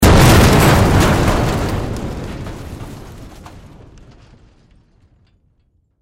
Explosion Car Metal Debris Autos; Military; Warfare; Explosions

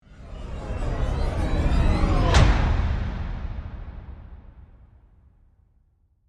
Sweeper, To, Hit, Flange, Transition; Production Element, Imaging Element, Accent; Sweepers; BlastWave

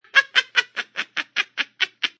Witch Cackle; Evil Laughs; Horror

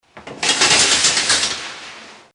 Shower Curtain Closed; Household

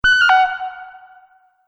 Multimedia Event Notification, beep sound effect; Beep Sound Effects

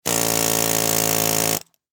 Industry Air Hammer Operate Long; Hammers; Tools; SFX Bible

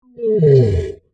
Monster Groan, Horror, Monster, Groan, Creature; Deep; Monsters; BlastWave

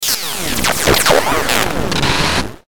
Glitch Electronic Digital Descend; glitches; Blastwave

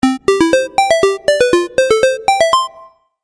Retro Game Melody; Video Game Sound Effects

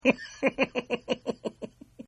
Giggle Male; Laughter; Partners In Rhyme

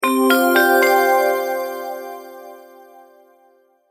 multimedia logo, Musical Identity/Signature

